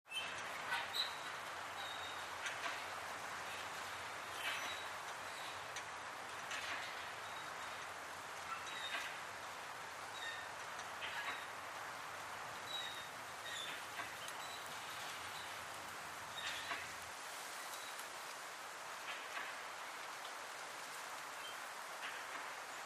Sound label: Mountain Wind Metal, eerie & gusts; Mountain Wind With Metal Windmill